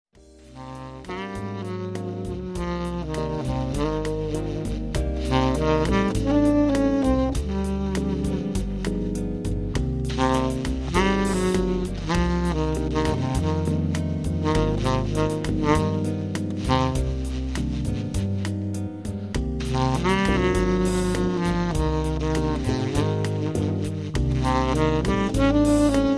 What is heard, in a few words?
subtone, sax